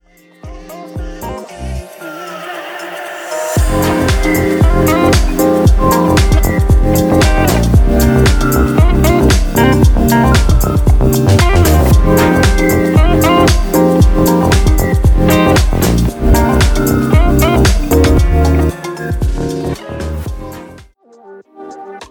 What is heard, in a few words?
Electropop